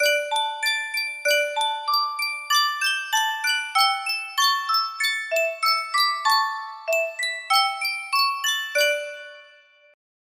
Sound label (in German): Sankyo miniature Spieluhr